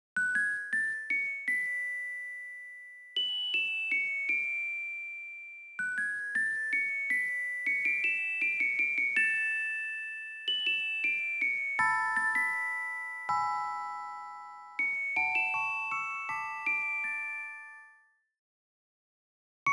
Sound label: jingle gift box open